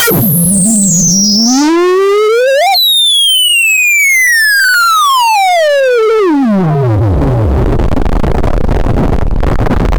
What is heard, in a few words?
monkey sounds; animal vocalization